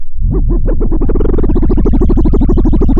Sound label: Sound of a mud pump